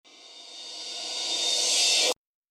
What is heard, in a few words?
Lost SE No Effect